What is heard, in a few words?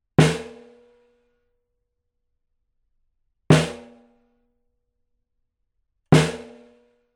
Snare - R121 Bi Direct - Edge modelled; bidirectional (osmička); edge duo srovnavaci test